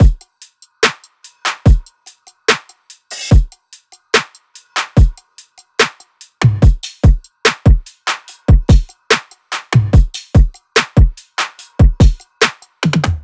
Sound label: loop full 145; Seismic Loops